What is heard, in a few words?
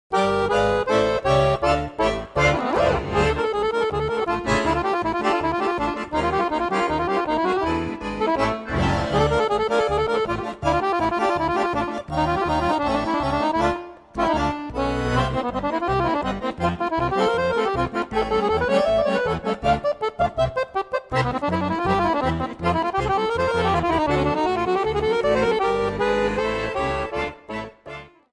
accordion; zzmusic